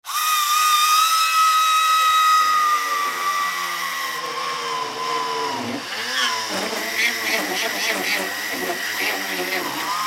Rotozip Cordless, Rotozip Cordless Turn On Run Bad Bearings Turn Off; Power Tool; Blastwave